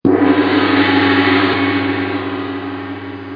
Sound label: Gong